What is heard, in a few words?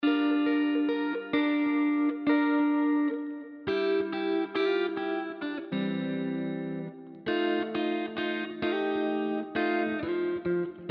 Intro Guitar